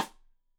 Side Stick Stereo; Deeply Sampled Drums

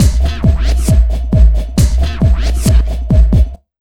Big Beat Drumloops, LOW 135

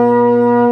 B3 C 2, ORGAN; VOCAL ORGAN

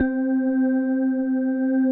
HOLLOW ORG; Greytsounds; Scott Peers Sound Engineering

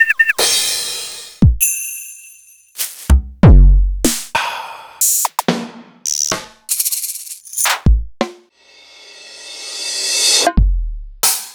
EMU Orbit

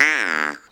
Duck call, cartoon Foley Fx; musicradar; cartoon caper

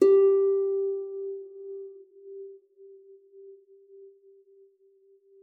F#4, HARP; Xsample VOL-8; HARP P+MF

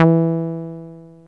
SOFT E3; Best Service Alpha Dance vol.2; SOFT SEQ.1

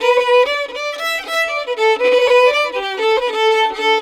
120 D violin, Celtic Flavours; USB Soundscan vol.42; AKAI

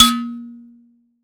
Finger, Prepared Kit; Battery 3 Sample Kits

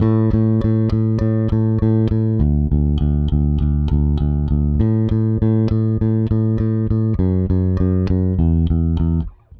Rock, Bass; Bass X

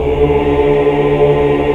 AAH 2 4 R; Best Service Gigapack 1

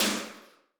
Lite Metal, Alesis D4

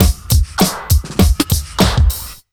100 Beat, Underground Hip Hop; USB Soundscan vol.02; AKAI

